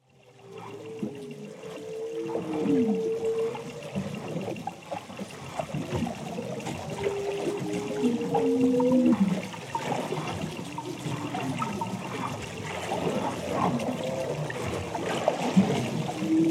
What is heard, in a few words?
Humpback Whales